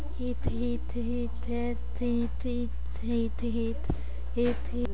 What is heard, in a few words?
hit